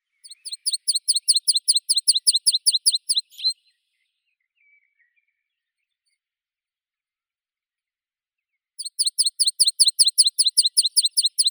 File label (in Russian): konek lugovoi anthus pratensis